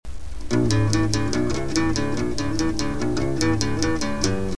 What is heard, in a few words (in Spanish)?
intro, bajo sexto y acordeon